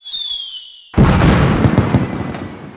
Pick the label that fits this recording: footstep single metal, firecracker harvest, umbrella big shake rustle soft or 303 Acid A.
firecracker harvest